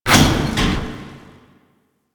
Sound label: Elevator Stop; Venus Kahl; Warframe; Quests; Veilbreaker